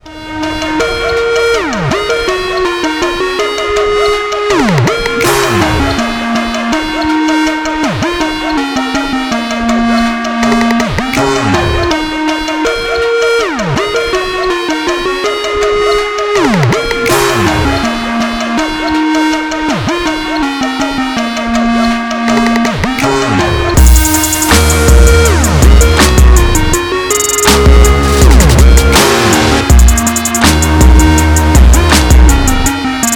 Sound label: dubstep